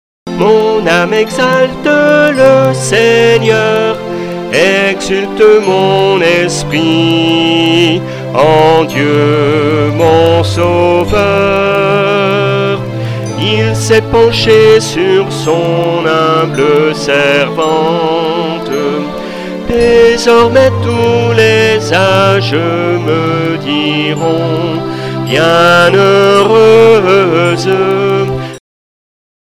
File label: Magnificat VERSETS